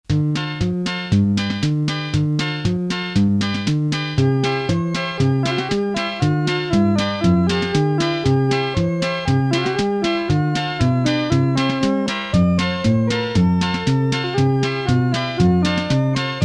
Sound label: Instrumental